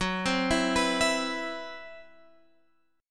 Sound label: C Major 7th Flat 5th F sharp, chord